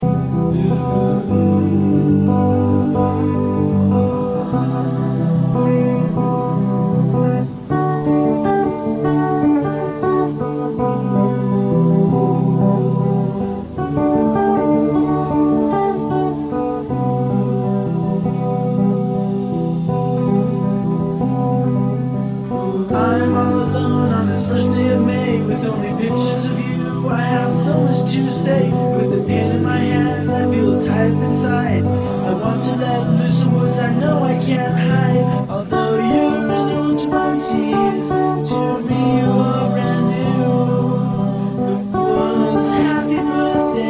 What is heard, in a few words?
birthday blues